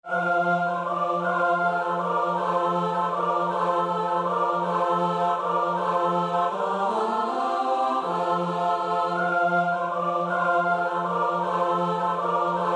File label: strike it up tabor, choir